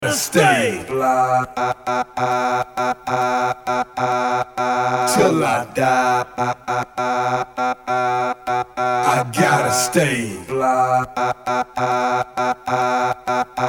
transition 100 128 acapin

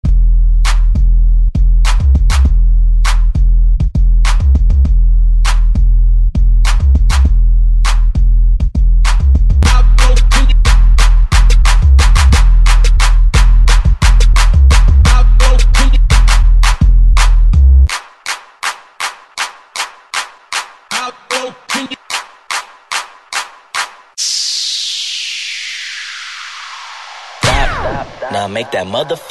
Dance Remix Transition 100-80

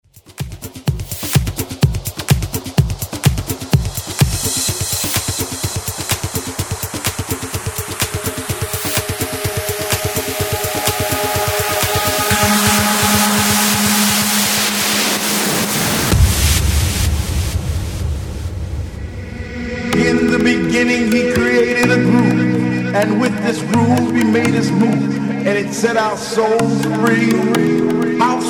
House Mashup

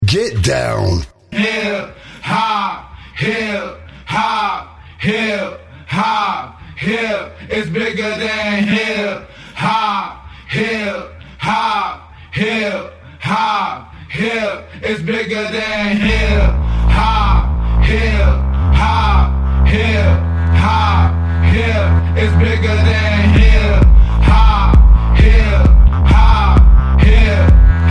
Hiphop Dubstep

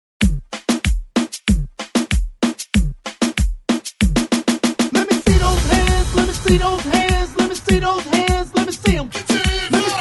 Transition 95 127

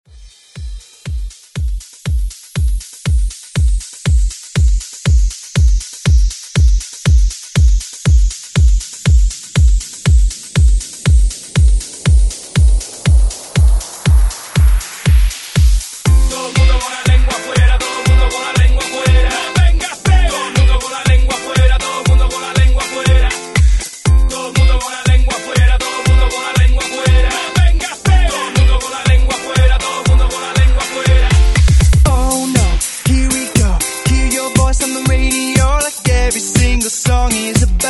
120 BPM HYPE REMIX

